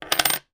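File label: foley 50 pence piece put down on surface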